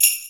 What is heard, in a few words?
BELL, PERCUSSION; Crypton - DrumBase5000